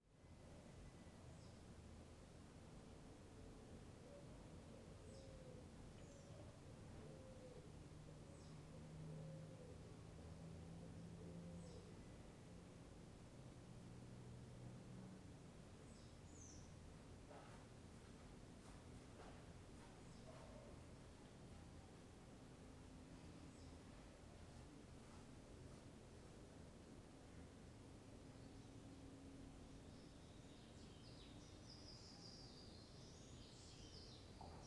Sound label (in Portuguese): Ambiente dentro de banheiro em chacara pombo passaros tarde; ambiencia domestica